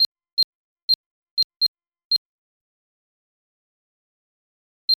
when you launch an ability, ability refresh

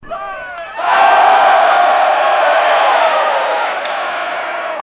a crowd in a staidum, booing

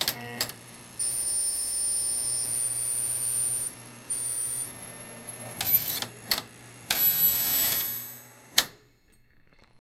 mechanical repair sound

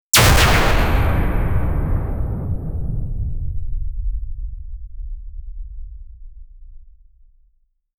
short laser blast blue en; weapon fire

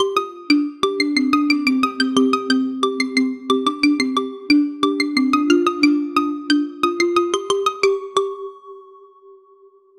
warm modern tech melody, plucks

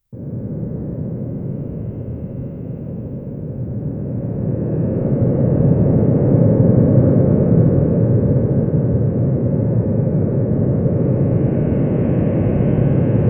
distant roaring wave ocea; eerie silence

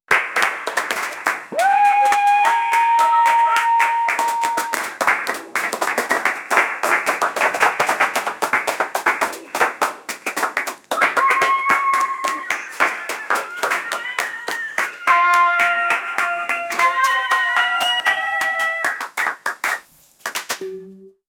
start fade in with a, circus

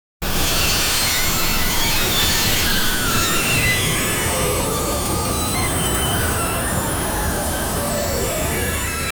searching, ape; kong

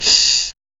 realistic snake, reptile

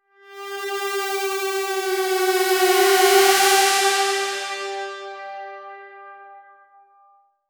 hard cinematic riser

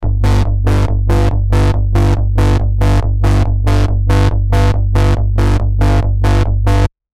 a dark reese bass

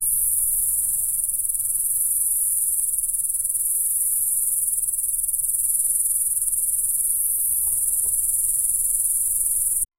sounds of cicada bug; insect

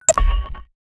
one shot game sfx, jingle

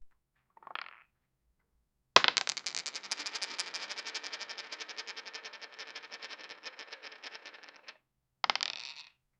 please create a sound of, dice

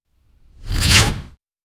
a sharp whoosh of an, arrow

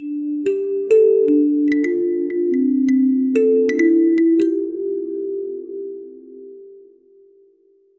soft divine flute and veena

